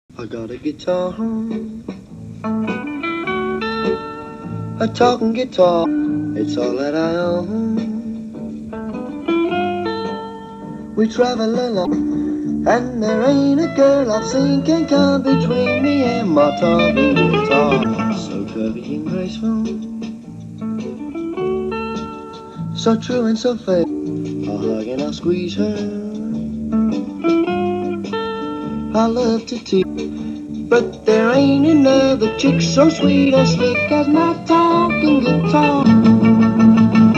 Talking Guitar

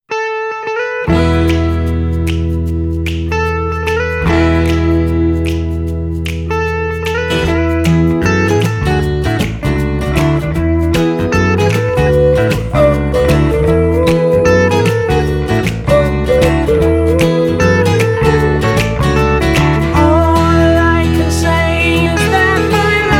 acoustic

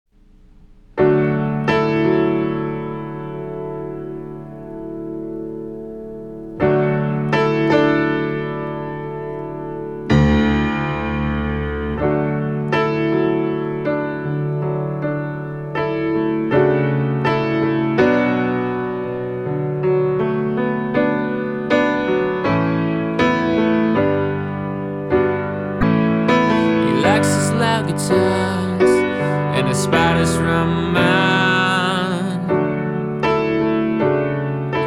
Piano and Voice

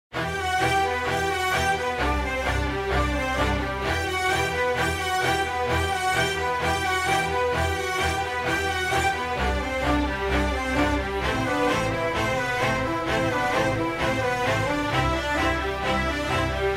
instrumental

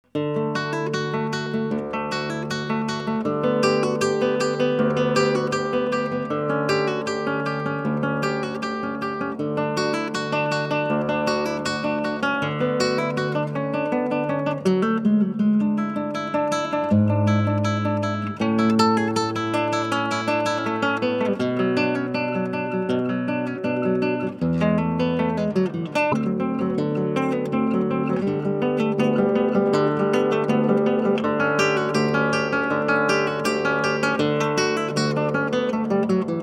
Suite No 1 in G Major for Solo Cello Prelude; If I Stay

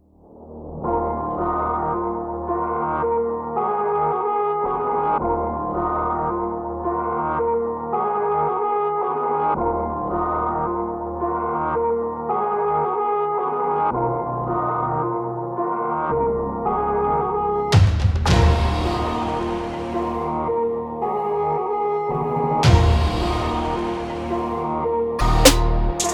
Instrumental

